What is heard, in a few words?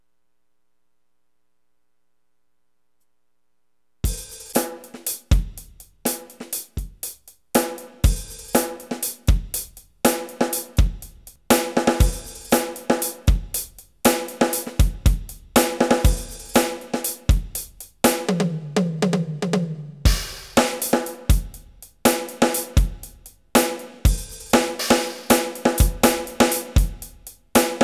spin sync 120